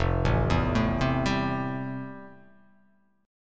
F Major 9th F; FM9 arpeggiated; JGuitar

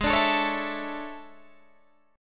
E 13th G sharp

